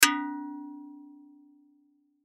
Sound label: Spittoon Ring; Cartoon Sound Design; Blastwave